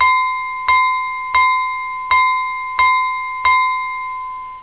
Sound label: Glass Bell; blender volume1.5 1995; PUMA